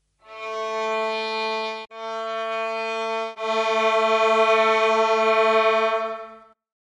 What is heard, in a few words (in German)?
geige